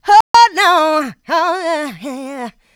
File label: voc, female vox, Vocal House